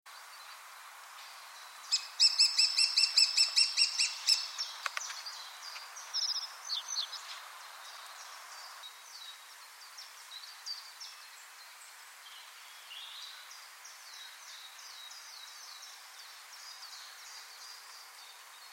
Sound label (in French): pic epeichette appel